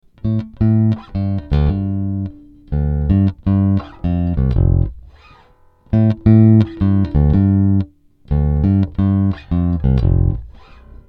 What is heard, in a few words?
bass